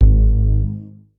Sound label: Big Bass G 3; Bassis